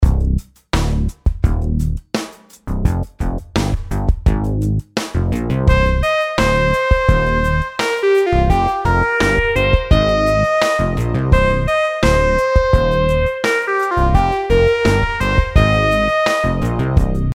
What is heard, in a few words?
rhythm simple 8s triplets